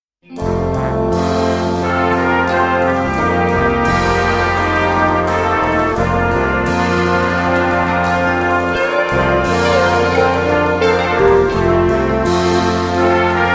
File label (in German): segen brass connection